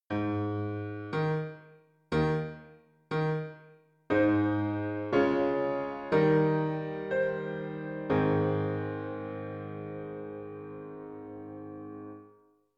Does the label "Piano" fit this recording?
Yes